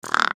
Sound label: Bird Raven Angry Squawk; Animal; Bird Sound Effects